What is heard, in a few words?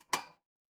glass ceramic